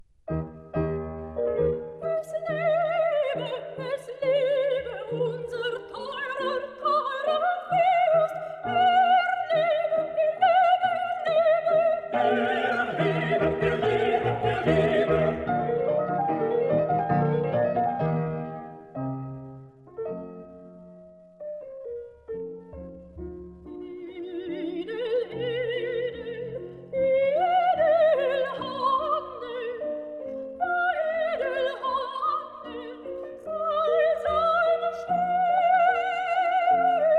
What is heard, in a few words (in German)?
Kantate fur eine Singstimme Sopran mit Chor und Klavierbegleitung Allegr; Lobkowitz